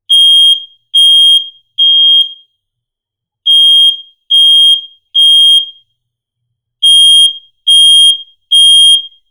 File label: beep beep beep